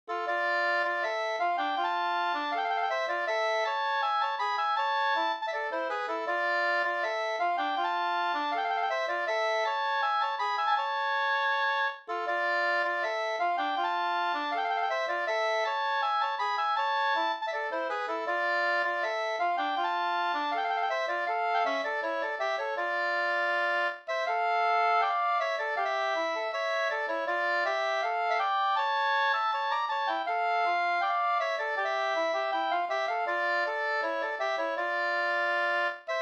ganglat; e Ola Ersson e Per Erik Svedin SvL

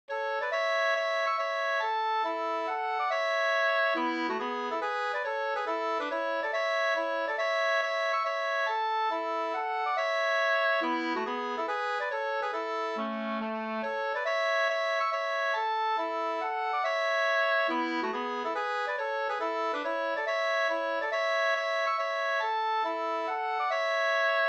reinlender

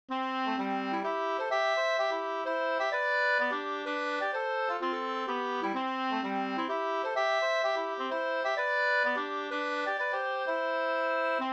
polska bondpolska; Urpolskan; Viksta Up

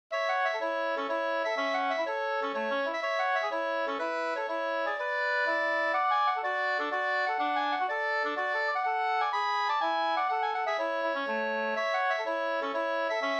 mazurka, 2st

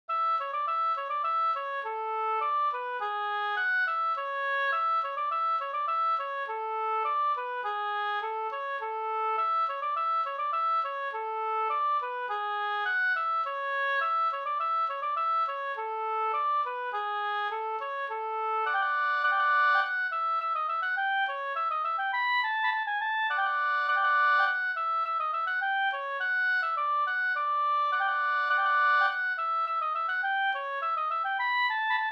polka polkett; Goken gal e Nils Jonsson Vb

